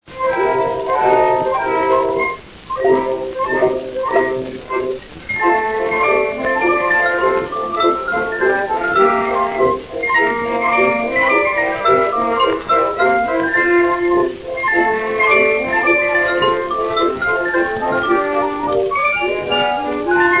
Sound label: Orchestra Bells; San Souci